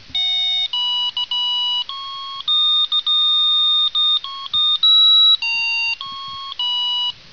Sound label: Oh Xmas Tree